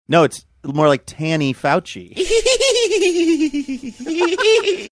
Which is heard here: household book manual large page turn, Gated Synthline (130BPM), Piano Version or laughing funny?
laughing funny